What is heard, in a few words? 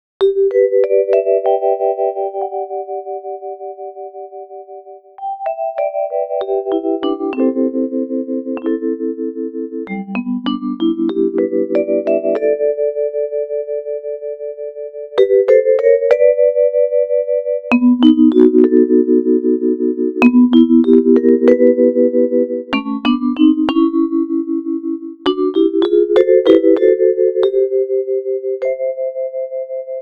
Vibrophone Fills